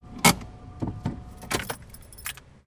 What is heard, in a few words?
stop keys and manual brake